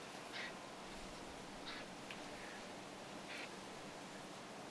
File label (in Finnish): kuvien kurpan aania